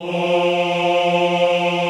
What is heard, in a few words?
OHH F2, Classical Choir; Best Service; Extended Classical Choir